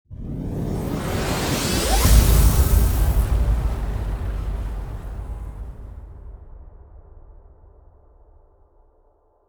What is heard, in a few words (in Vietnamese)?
Tieng chuyen doi du lieu lon khoa hoc vien tuong